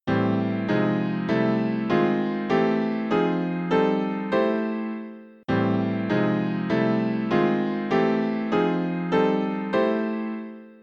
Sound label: harmony